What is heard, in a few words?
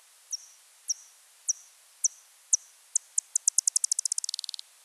Pipistrellus pygmaeus